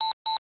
target on; weapons; vulcanus5

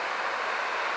gas loop; weapons; m3dragonm

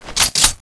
after reload; weapons; m3dragon

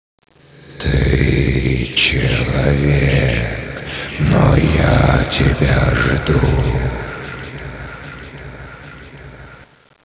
zombie last

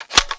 clip in; weapons; cstrike

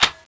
clip out; weapons; balrog5

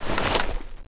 speargun draw empty; weapons; speargunm

